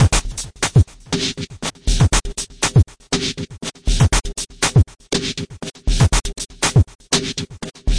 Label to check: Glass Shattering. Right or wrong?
Wrong